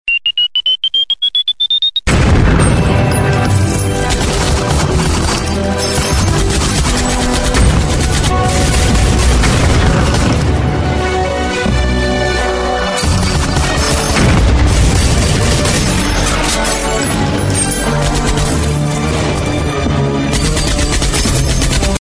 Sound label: huawei piano ringtone